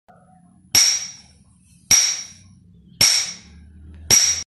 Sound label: copper hammer; چکش مسگری